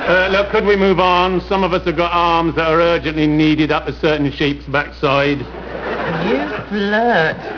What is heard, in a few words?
sheep